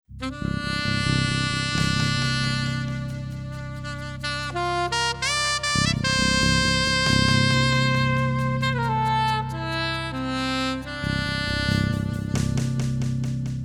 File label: Sax Overdub Section